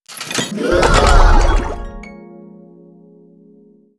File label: Level Unlock